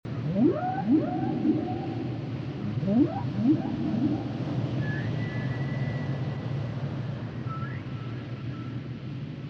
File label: whale